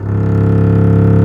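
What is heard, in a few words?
STRINGS, Cb Bowed f; SOLO SECTION; Roland - LA Composer vol. 1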